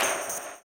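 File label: tambourine, 160; Spectrasonics; Vocal Planet; Blues Soul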